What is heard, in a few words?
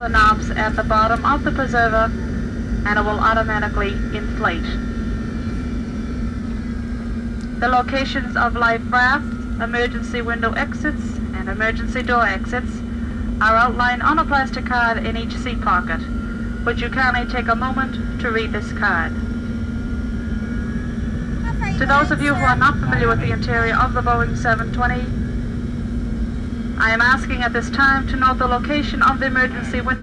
Airplane Jet trip